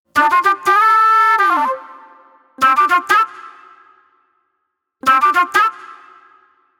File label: Acid Jazz Flute